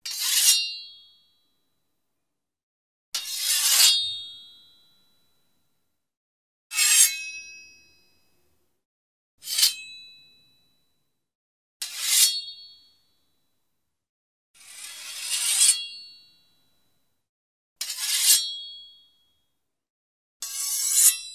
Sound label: Drawn sword sound effects